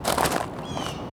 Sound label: Park Gravel Footstep and Bird; Third Ward Emancipation; Houston